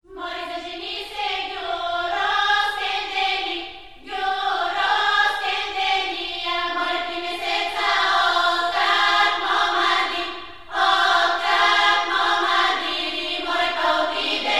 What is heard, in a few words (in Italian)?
Voci Bulgare, Esempio ritmico e armonico; Cori Polifonici; guida ascolto